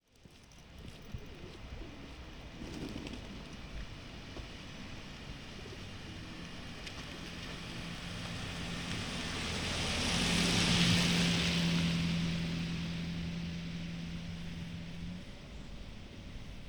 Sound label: binaural